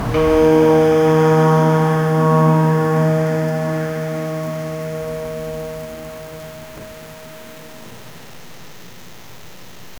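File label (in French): statue en bois posé sur; object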